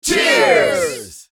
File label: People toasting celebration cheers